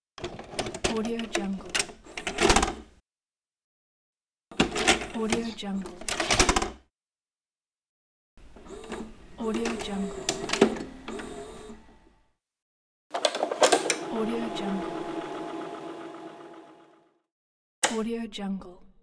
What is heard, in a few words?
vhs sounds